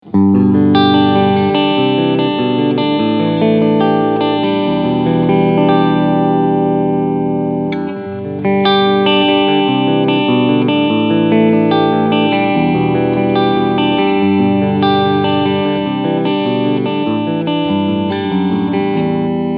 Clean Picking beide PUs

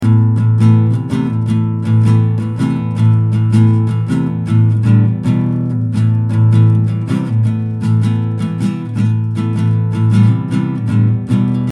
Aufnahme einer Akustik Gitarre mit FX

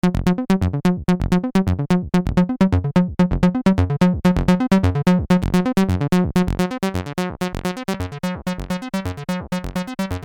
Klangbeispiele Saegezahn Rechteck

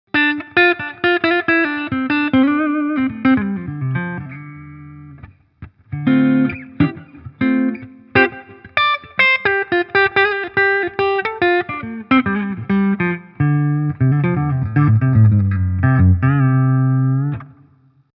Hagstrom Alvar clean Hals

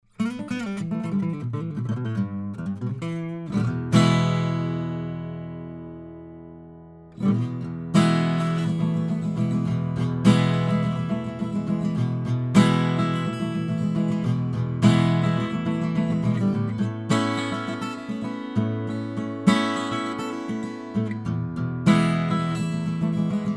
WA47jr Gitarre Close

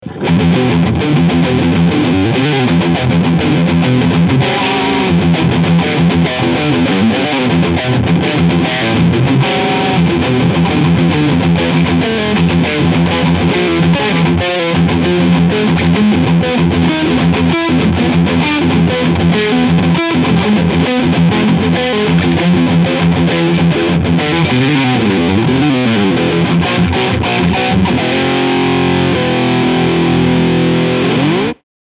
Harley Benton Dullahan FT 24 BKS Riff Sound Steg Pickup

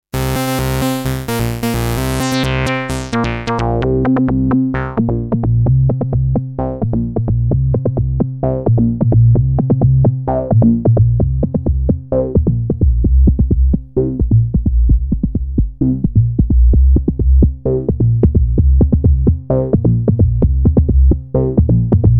Tiefbass Saw; MBS 100

